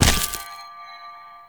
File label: Destructible Plant B Burst A; Warframe; Ambience; Grineer